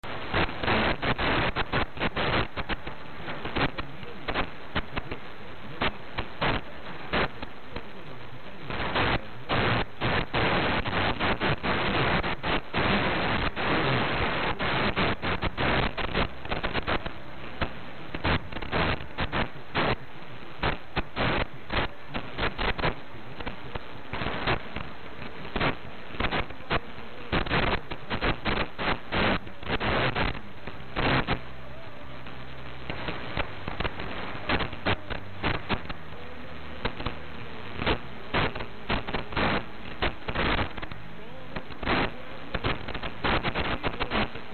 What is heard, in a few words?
AM1330 Noise